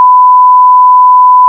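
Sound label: sine; ocaml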